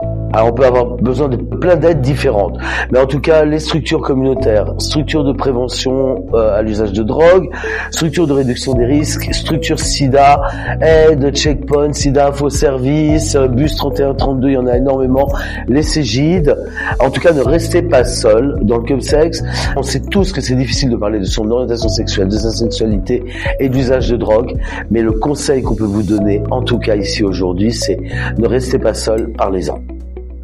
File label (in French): musique; chemsex a qui en parler